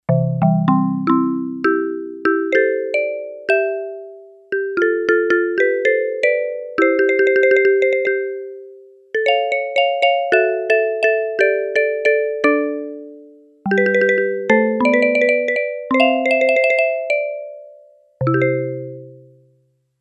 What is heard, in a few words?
Marimba, Yamaha DX11; Factory Preset Voices